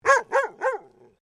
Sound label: Animal Dog Dalmatian Female Bark